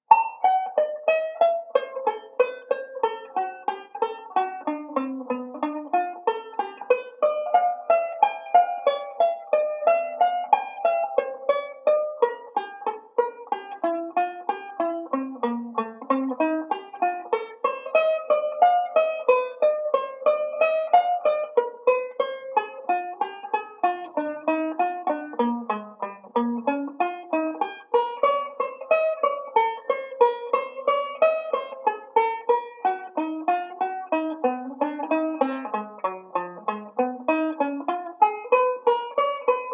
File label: slow, chord melody; Beyond Chord Melody Volume II